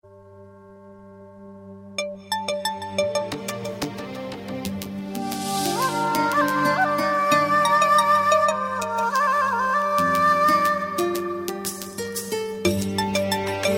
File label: arabic cute ringtone